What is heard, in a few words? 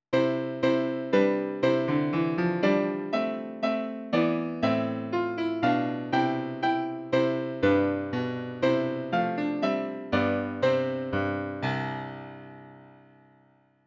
minuet